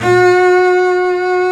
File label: Bass Leg G F#4, Virtuoso Samples; Digital Sound Factory Virtuoso